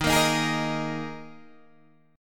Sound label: D sharp Suspended 4th, Guitar, Standard; strummed; chord; JGuitar